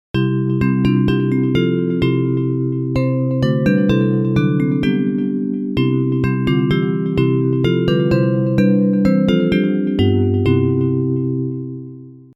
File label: Bells; Hymns